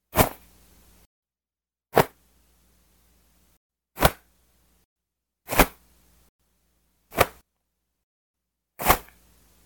Combat Whoosh Cloth Texture Foley

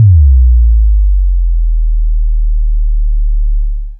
Bass drop

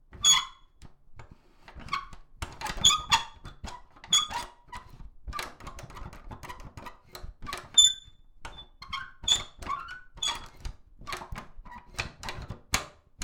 Squeaky Door Knob

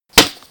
Punch

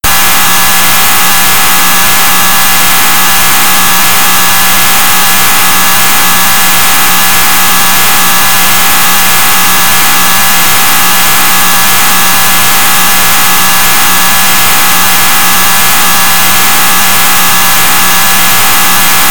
Screamer Jumpscare

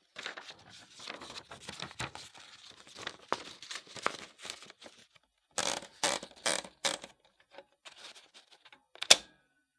Fixing Paper In Typewriter Fast

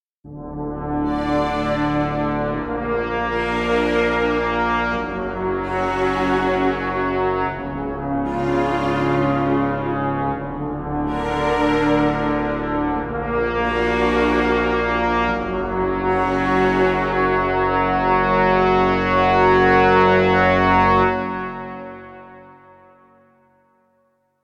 Dangerous Cinematic Brass Theme

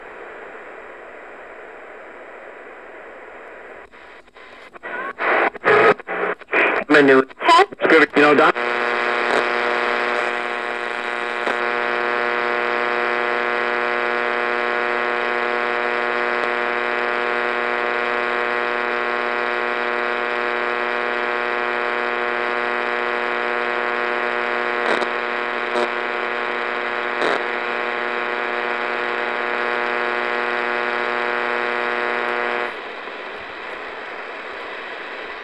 Radio Static

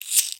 Lighter Long

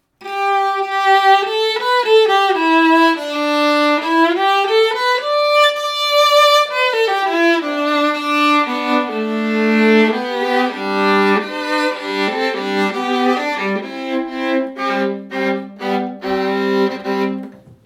Viola Warming Up

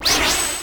Light Magic

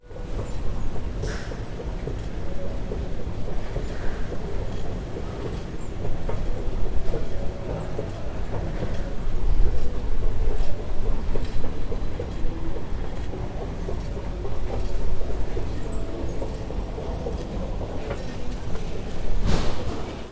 Metro escalator

